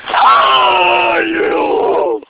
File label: ahhhhhhhhhh iueo, ア゛ーーイ゛ウエ゛ォ; ゾロアスターじゃんけん